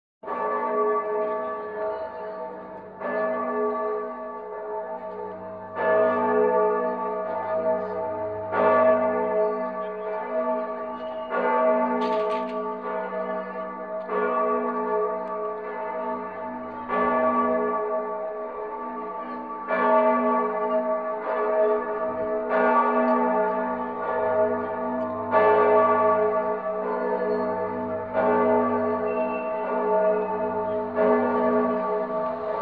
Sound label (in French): Cloches de la cathdrale de Florence